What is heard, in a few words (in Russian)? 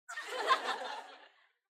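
короткий смех в толпе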